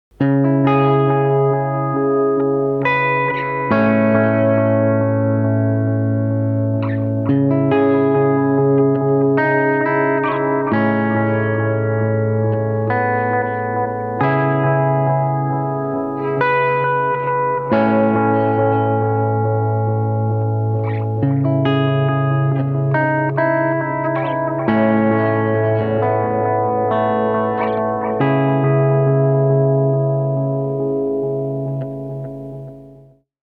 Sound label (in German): EQ Compressor und Reverb nach Amp Emulation